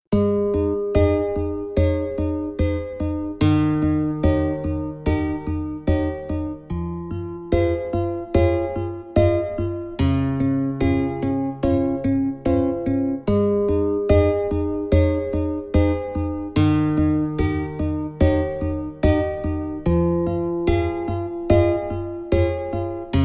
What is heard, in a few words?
hawaiian guitar, 432 Hz